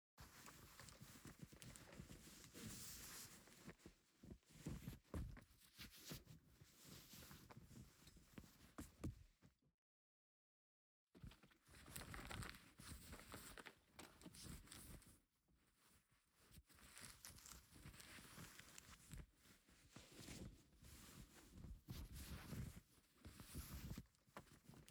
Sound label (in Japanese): 全裸スポンジで全身マッサージ ASMR